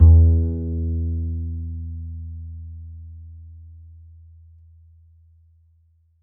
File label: A, upright acoustic bass, A string